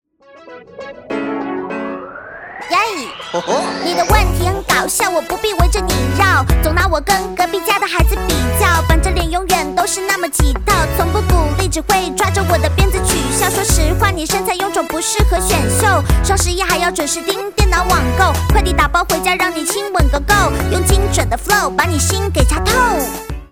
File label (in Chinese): 女,童声 rap 翻唱